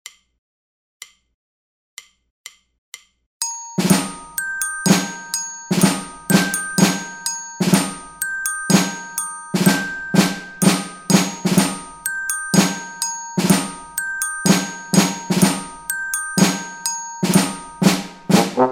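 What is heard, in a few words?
rock trombone percussion; reveille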